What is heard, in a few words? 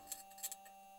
mic, mars2020 edlcam